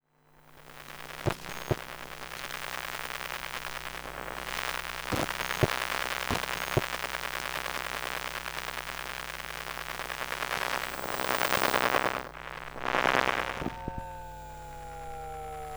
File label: EMF Asus Laptop, Electromagnetic Field & Emissions; Fox Audio Post-Production